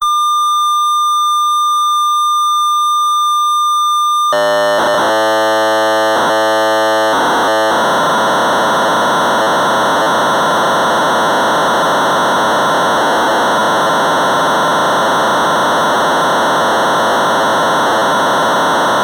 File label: ROBOT INSTR, sol-20 software tape wav files; processor technology